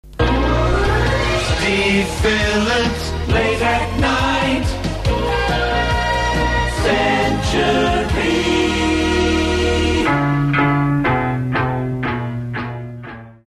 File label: late at night jingle; Steve Philips late at night jingle